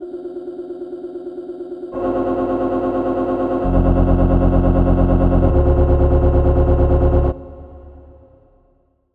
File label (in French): Ambient boucle